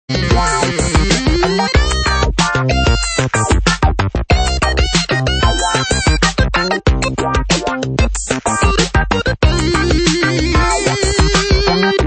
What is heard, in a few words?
Funk